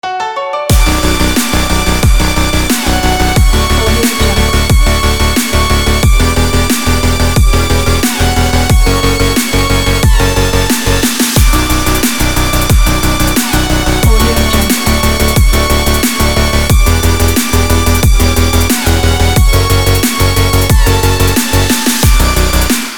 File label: sadness future bass